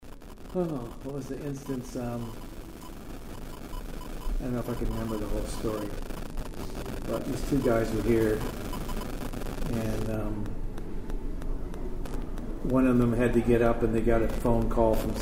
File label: Static Metronomic ticks